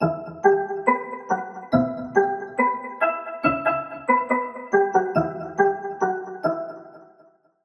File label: Pluck; Crystal Animal; Routed Sound; Vital Expansion - Story Walker Vol.1